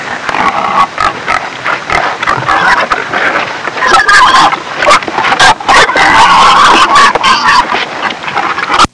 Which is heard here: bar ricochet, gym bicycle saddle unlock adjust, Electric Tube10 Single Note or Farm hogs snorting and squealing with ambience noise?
Farm hogs snorting and squealing with ambience noise